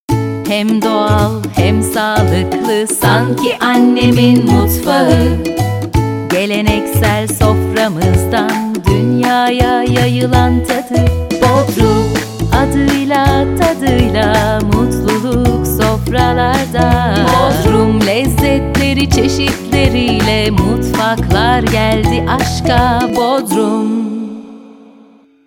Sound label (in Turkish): vokal jingle; bodrum